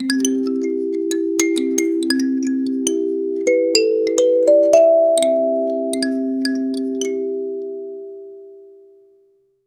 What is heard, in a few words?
clear kalimba playing